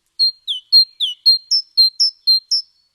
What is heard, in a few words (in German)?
Kohlmeise